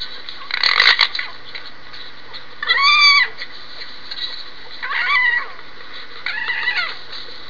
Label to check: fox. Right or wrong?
Right